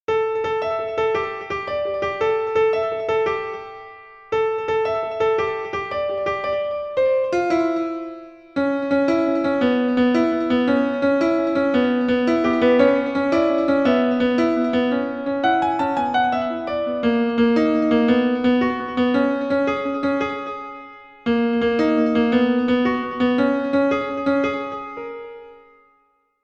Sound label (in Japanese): ジングル; ノースウェーブ